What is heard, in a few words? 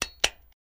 Lighter Open Click; lighters & matches